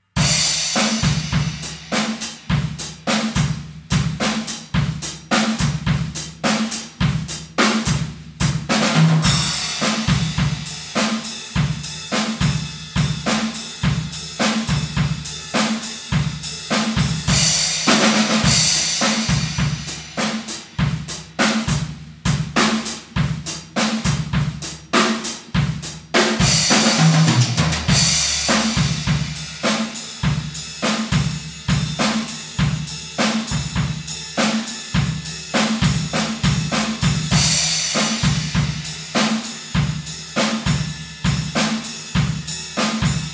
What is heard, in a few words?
Off Beat Drum Solo